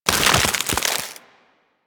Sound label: Ice Wave Spawn; Enemies; Abilities; Warframe; Corpus; Arachnoid Camper Terra